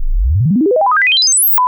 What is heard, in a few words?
Sweep